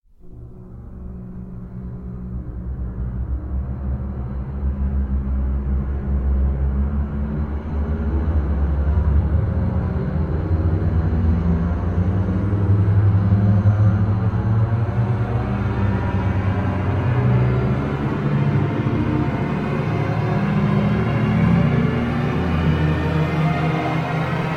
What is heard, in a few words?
suspense; DayZ-Epoch; dayz sfx